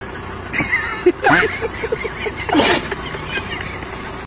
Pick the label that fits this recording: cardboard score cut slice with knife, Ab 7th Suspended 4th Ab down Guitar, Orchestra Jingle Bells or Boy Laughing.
Boy Laughing